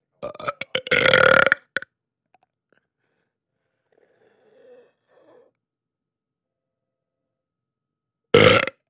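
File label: Double Belch Throat, belching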